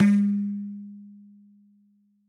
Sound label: dhum, mrid